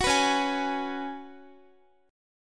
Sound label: Db 7th Suspended 4th Db down Guitar; strummed